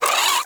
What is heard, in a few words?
Vocal Attack, Hunting Critters; Nexifera